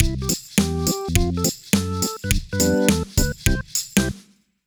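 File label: Jingle Menu Election; clubhouse games 51 worldwide classics 2020 switch gamerip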